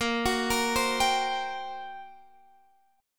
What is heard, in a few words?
G Minor Major 7th Double Flat 5th, Bb